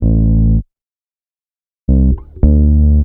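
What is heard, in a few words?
BASS 1, 100 bpm, Funk; USB Soundscan vol.41; AKAI; Roots Funk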